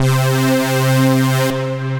Anthem, Synth Lead

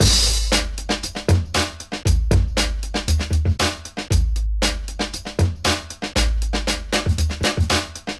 Drums, breakbeats; Loose Foot; Ableton; Breakbeats by KutMasta Kurt

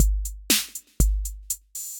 Kit 606, Effected Drum Kits; Drum Machines; Ableton; Factory Packs

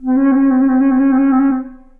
Concert Flute Solo Half Step Trills; Ableton; Orchestral Woodwinds

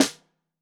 Bottom, Snare

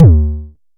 analog tonal, Kickbank; Ableton Foundation FreePacks

